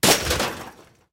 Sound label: fence metal small fall; tanks; War Thunder